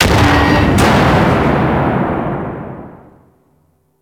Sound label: Space Large Container Explosion; Corpus Space